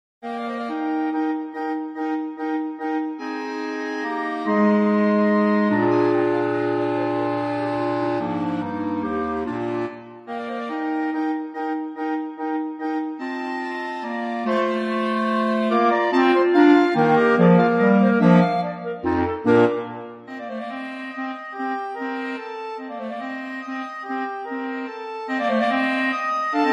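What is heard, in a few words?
Menuetto K575 Clarinet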